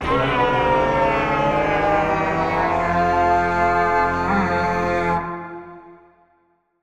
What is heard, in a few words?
call, goat horn; Minecraft